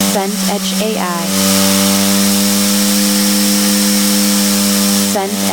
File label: strimmer running